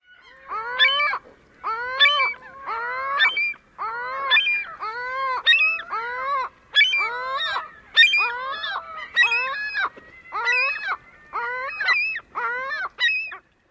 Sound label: calls